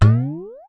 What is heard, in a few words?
Pitched tins, Foley; Foley Fx; cartoon; musicradar; cartoon caper